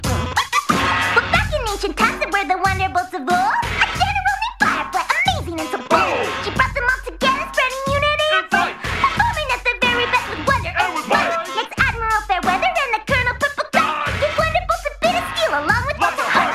wonderbolts rap

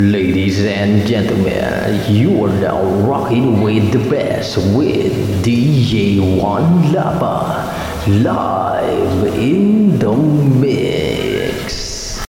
ringtone

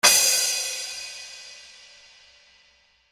cymbal sound

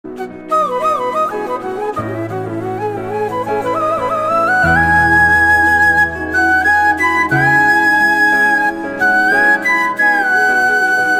Flute; Krish

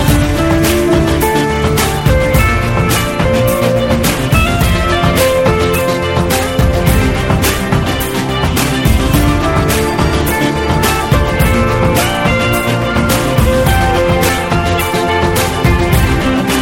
Guitar instrumental; gunday

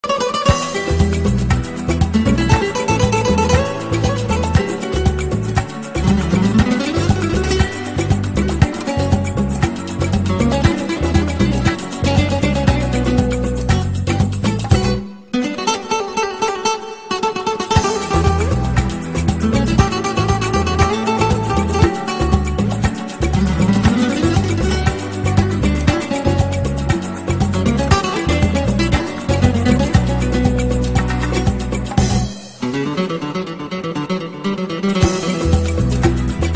guitar music

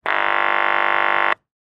intercom buzzer

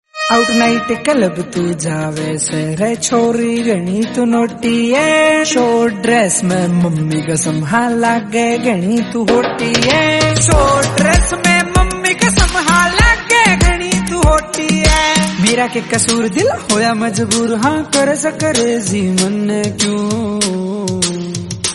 Haryanvi Beat

